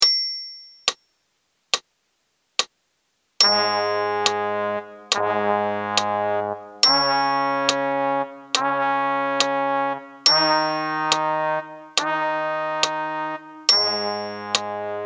New notes in Bb, brass; backing tracks

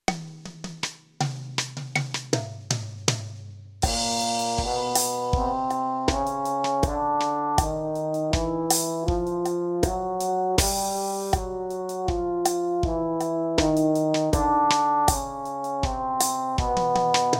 Chromatic in Bb, brass; backing tracks

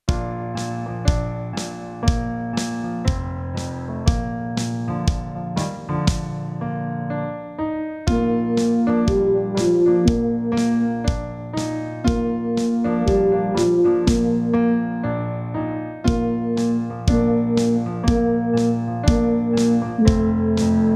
tutti, brass; the Saints; backing tracks

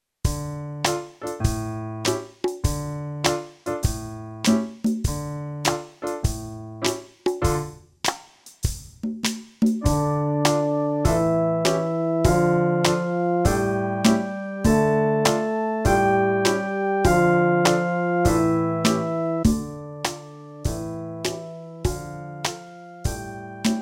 Scales in F, brass

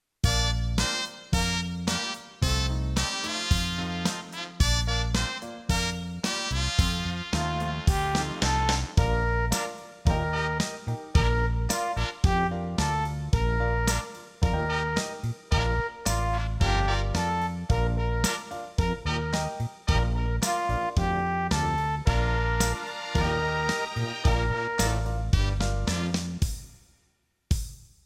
Miss Mary in F

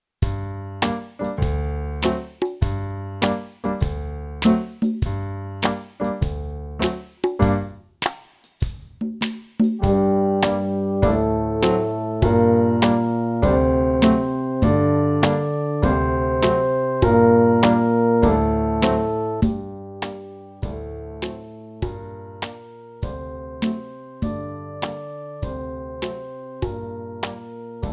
Scales in Bb, brass practice

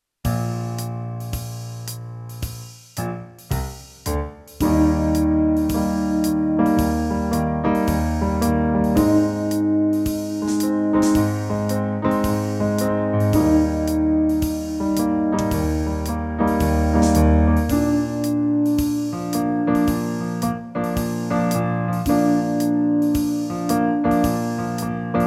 in Eb low, brass practice

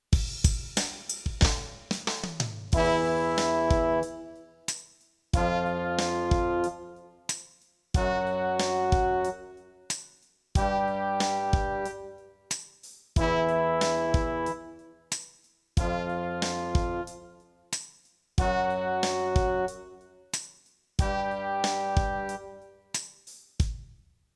Find in F, brass practice

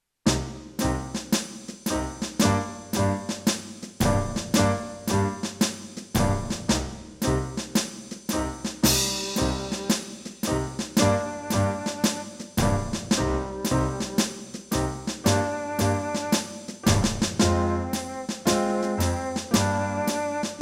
On Parade tutti, brass

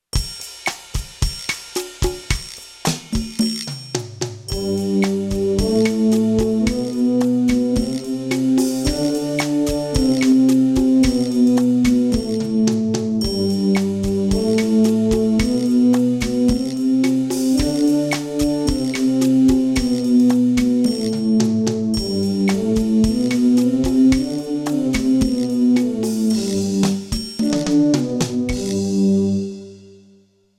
G major Scales Bb, brass practice

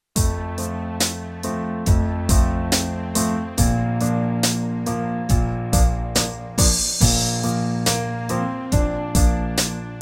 tutti, brass; Pease Pudding; backing tracks

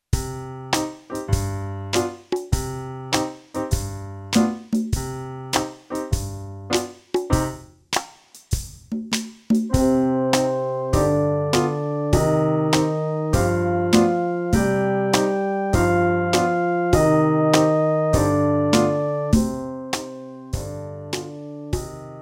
Scales in Eb, practice

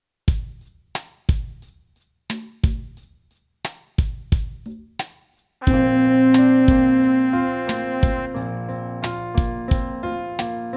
Pitch Match, brass practice

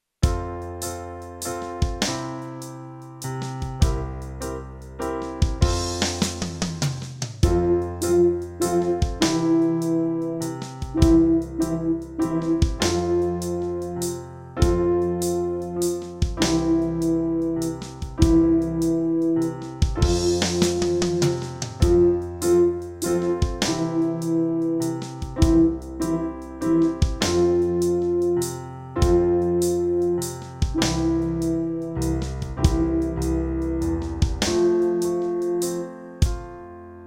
F low Bb mid, brass practice

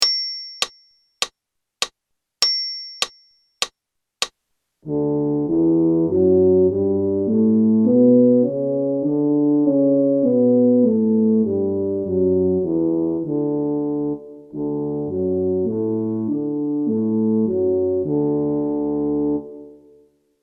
A major in F, D maj, count in, brass

